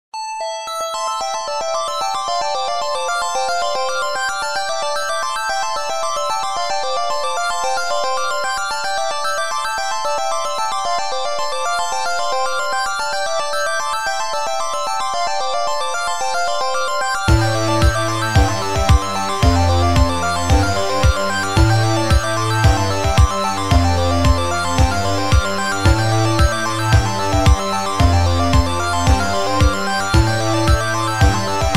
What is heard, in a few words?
bells